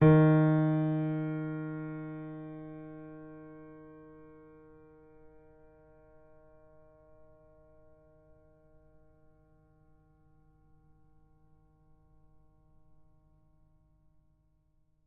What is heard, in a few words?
D sharp 3